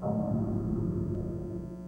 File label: bell